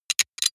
sci-fi handling click short; comedy-cartoon; pistolen-gewehre

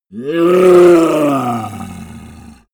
yeti growl painful

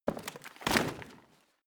wood bing drop; berufe; foerster

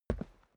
step boots concrete single right; menschen; schritte

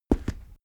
step stone walk; schritte

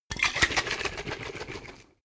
bottle vodka shake foam fast; gastronomie; berufe

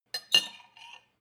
cutlery on plate clatter and scrape, gastronomie; berufe; soundpool

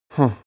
male sigh disappointed

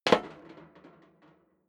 step leather shoes metal rattle single stair down; schritte

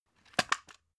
drug package drop hard; berufe; arzt

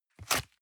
box small tear apart hard; industrie wirtschaft; bueroarbeit

